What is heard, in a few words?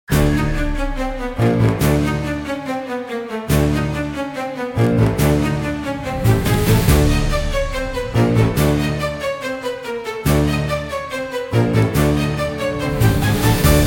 Tuba Tumble Run, comical orchestra for slapstick gag scene